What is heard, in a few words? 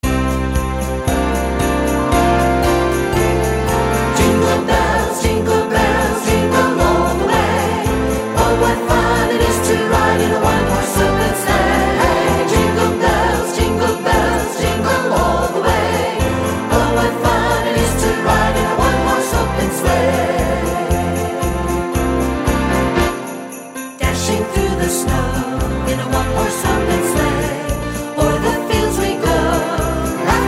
Jingle Bells